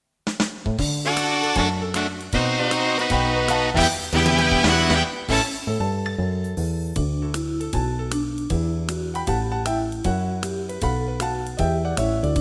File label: karaoke only